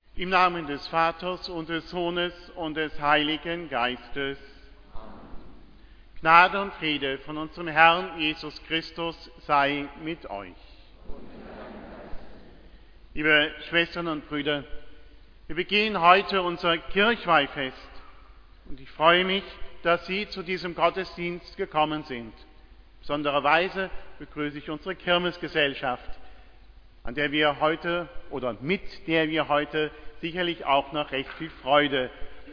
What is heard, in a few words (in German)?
einleitung kyrie